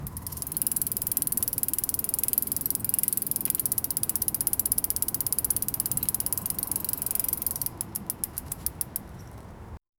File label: loose nuts and bolts soun